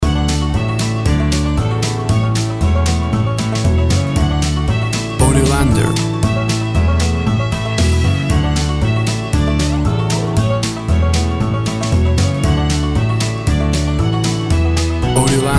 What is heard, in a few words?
Uptempo Country Banjo Music